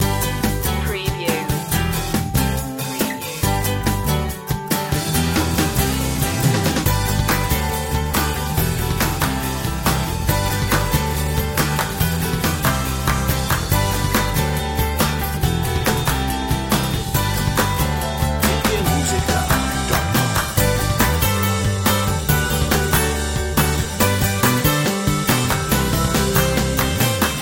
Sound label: building momentum loop